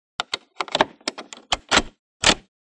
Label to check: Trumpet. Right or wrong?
Wrong